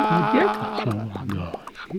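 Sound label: Music Village Calm Inside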